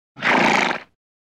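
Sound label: horse voice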